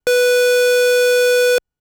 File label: Square B4, keys; fleet keys Samples